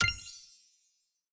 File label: Event Progress Particles Impact; subway surfers city sound effects; Android, iOS